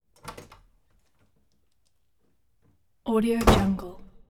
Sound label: half glass door